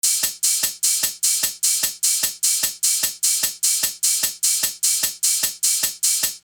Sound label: Cymbal Loop 150 BPM